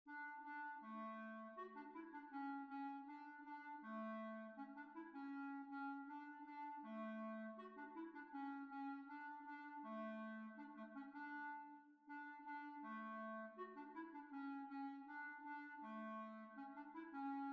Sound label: Jig